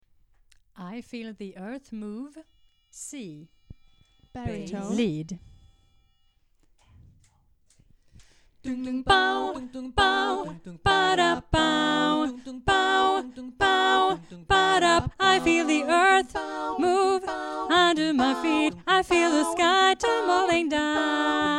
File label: Lead mix